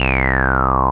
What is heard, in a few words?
BAS, Eurotech; Sounds Good